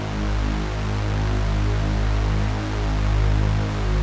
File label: Huge Bass; Vostok Inc.